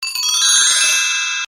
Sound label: Correct feedback sfx